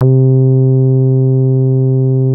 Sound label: Anasound Long mid C, Analog Single Notes